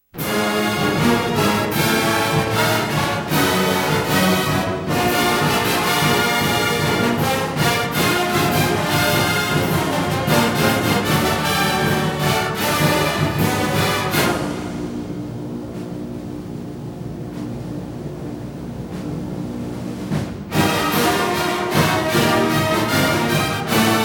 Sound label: Fanfare; University Of Wisconsin Marching Band; Badger Bandstand